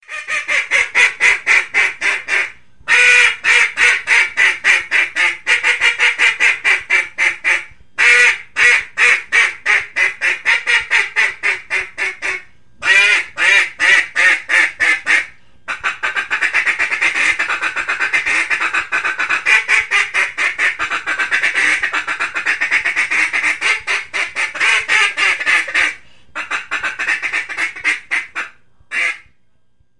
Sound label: Duck Call